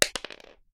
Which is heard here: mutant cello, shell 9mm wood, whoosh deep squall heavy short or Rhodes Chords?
shell 9mm wood